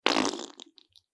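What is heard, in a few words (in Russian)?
мокрый пук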